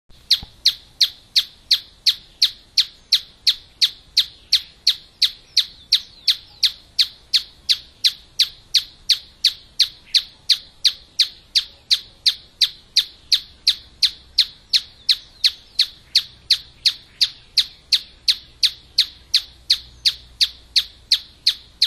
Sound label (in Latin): Scytalopus pachecoi SONG; Rio dos Touros; Jorge La Grotteria